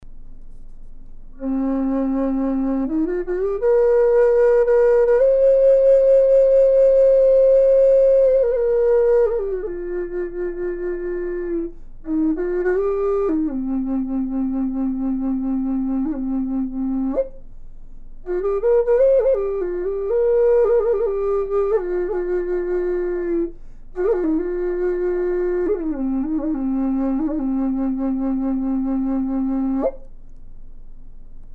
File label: Low C Al Yel Cedar, flutes